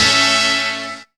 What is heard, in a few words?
SD HIT; Zero-G; Funky Elements